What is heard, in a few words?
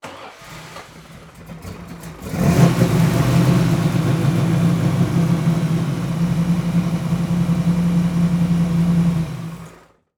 Transportation Car 31 Ford High Boy Start Idle Off Mic In Front